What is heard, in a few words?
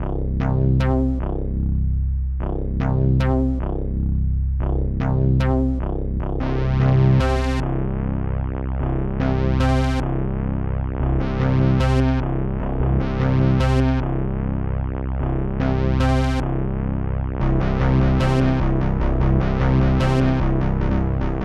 bas 2 bas; The Module Collection 1